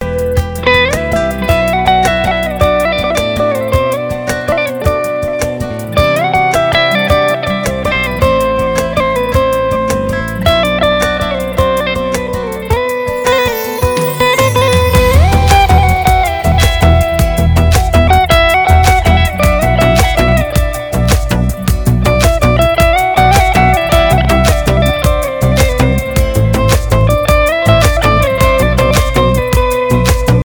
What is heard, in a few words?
ringtone